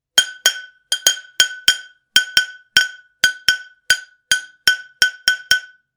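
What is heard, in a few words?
saucepan sound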